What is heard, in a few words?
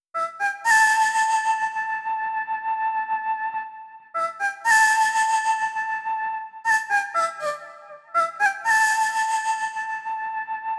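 Lotus Flute 120 bpm D major; New Nation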